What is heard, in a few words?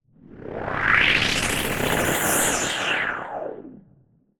sound design transition filter pass sweep delayed warble